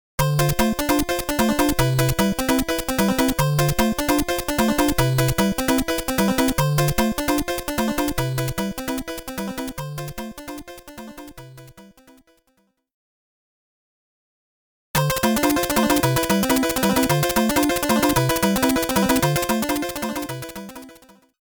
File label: invincible bgm - warning - invincible bgm (hurry up)